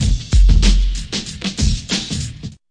95 bpm dope traks drags, drum loops and breaks; 091 to 100 bpm

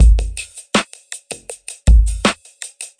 80 klicka machine train, drum loops and breaks; 000 to 080 bpm

